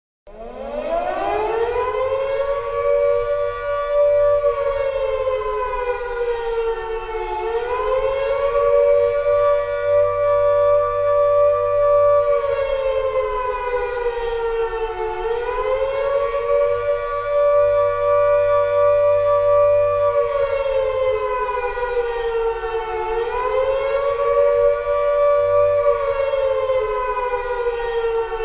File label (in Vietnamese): tieng coi bao dong hat nhan keu